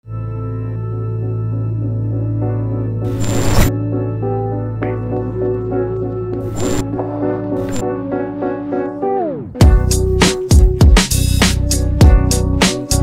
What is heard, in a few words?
instrument; persian